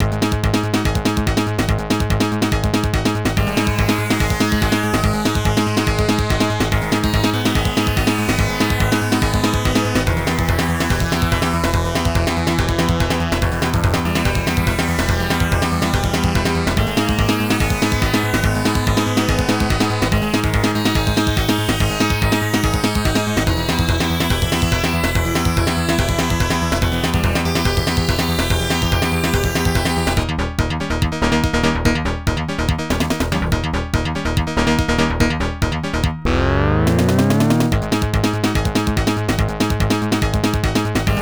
Rock Minigame; dashin desperadoes; genesis

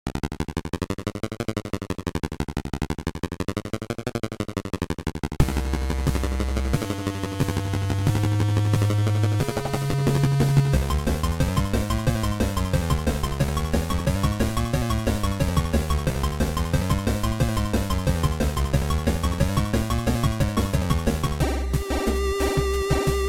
bgm boss

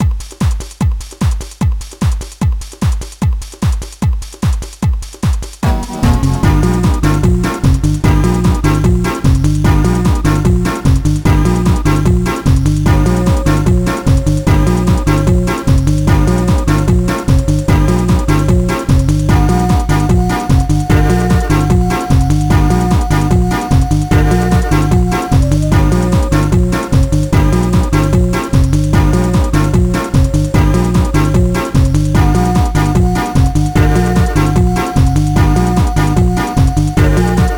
Jungle; Pinky and the Brain The Master Plan; GBA

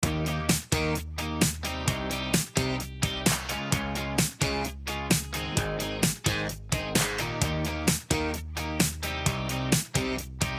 MUSIC DJ Game; Equestria Girls

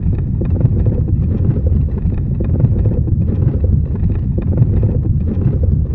Medium Roller Long; Chronovolt

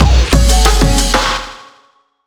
MUSIC JINGLE LEVEL COMPLETE NEW BEST

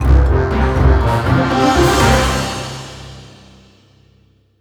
Win Light; Citadels

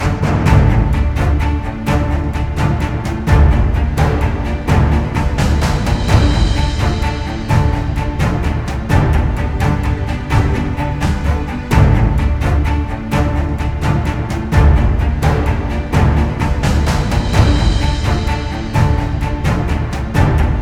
Scoreboard Lose

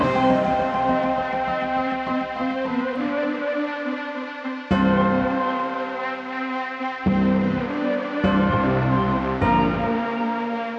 Claws Loop No drums theremin; jenny leclue detectiv

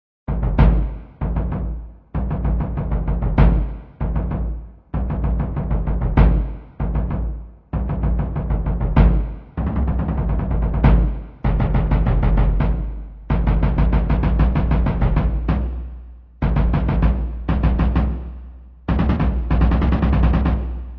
Fast Strings Drums; gravity compass

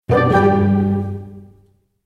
Splash; Super Granny 6

